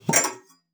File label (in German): Ventil; Xsample VOL-3; STACC+FX